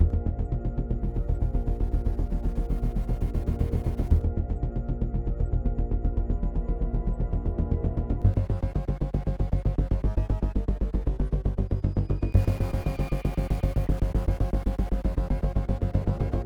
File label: Menu